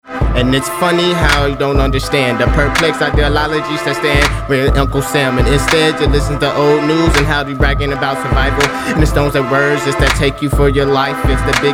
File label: HIP HOP